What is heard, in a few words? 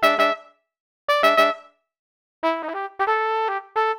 blues, brass; arturia